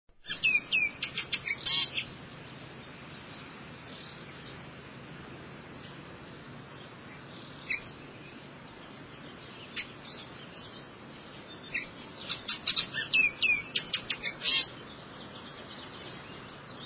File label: Bullock's Oriole; Birds